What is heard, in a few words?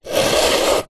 foley wooden box small empty slide smooth concrete screed